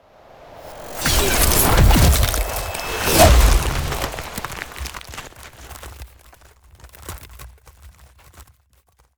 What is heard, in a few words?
ice spell ultimate; Ultimate Ice Spells; Polar Annihilation; Arctic Aura